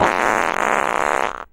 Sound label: fart long